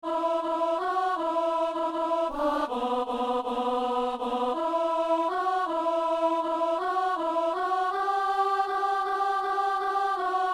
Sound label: alto; Saint-Denis; Je vous salue, Marie (Angelus)